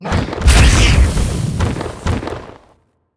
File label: Fairy Dragon atk